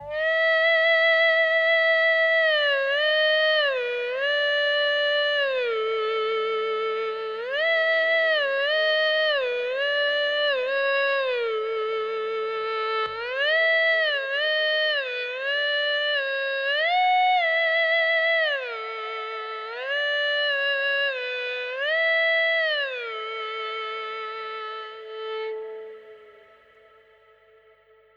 strat fretless bow; guitares electriques

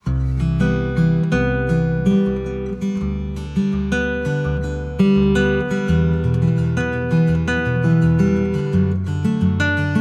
7 cordes; 7 cordas de aço; luthier de São Paulo, Regis Bonilha

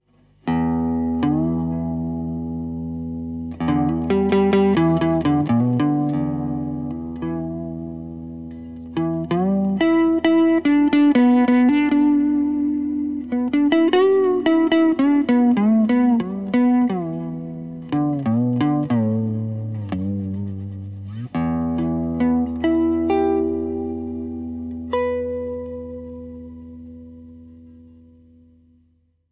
strat fretless; guitares electriques

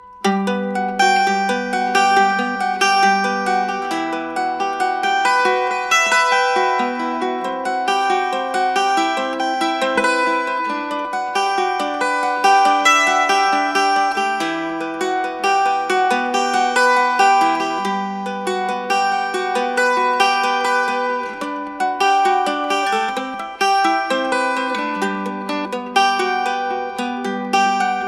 Bandurria; Un super outil pour des textures aigues et perçantes, la taille d' un ukulélé ténor

